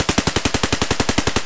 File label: aksu distant loop silenced; Escape from Tarkov